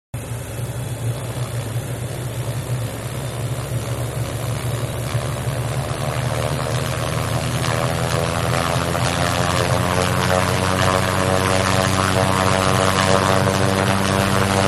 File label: Helicopter Aerospatial-Start Up, Idle And Launch; Helicopters